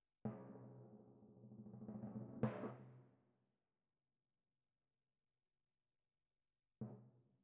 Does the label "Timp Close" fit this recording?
Yes